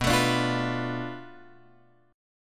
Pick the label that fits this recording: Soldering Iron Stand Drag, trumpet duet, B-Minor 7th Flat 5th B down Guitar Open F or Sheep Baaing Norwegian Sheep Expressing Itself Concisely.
B-Minor 7th Flat 5th B down Guitar Open F